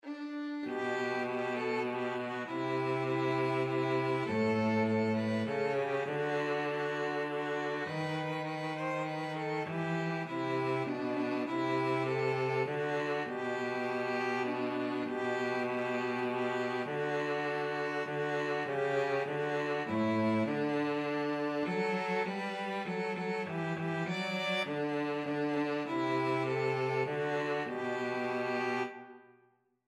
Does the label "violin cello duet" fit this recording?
Yes